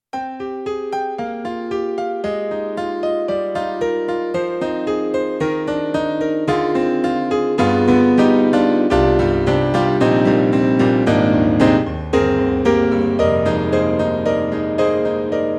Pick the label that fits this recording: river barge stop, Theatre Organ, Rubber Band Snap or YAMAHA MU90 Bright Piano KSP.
YAMAHA MU90 Bright Piano KSP